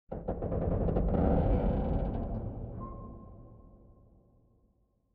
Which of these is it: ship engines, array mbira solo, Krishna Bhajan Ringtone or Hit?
ship engines